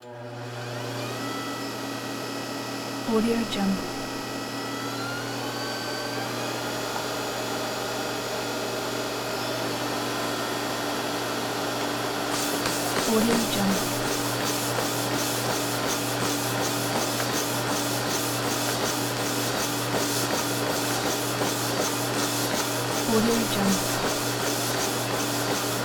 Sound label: sports treadmills treadmill on whine jog off; human sounds; دویدن نسبتاً تند